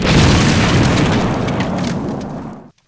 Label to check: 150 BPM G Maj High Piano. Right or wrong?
Wrong